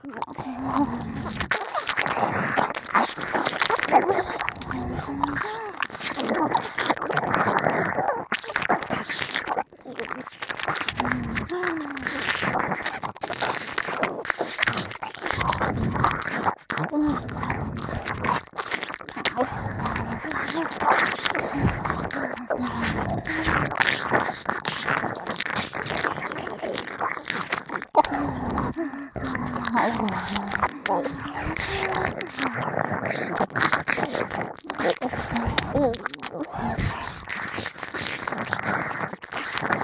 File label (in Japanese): 耳舐め,ASMR; マゾタマの錬金術師